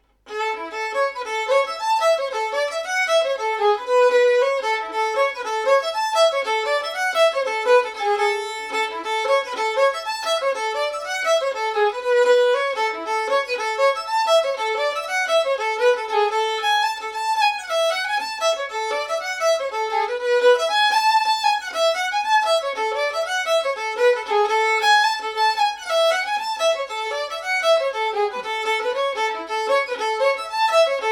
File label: Fiddle; The Roman Wall